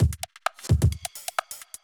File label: electro kit 130